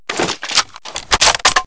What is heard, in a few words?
reload sniper Clip In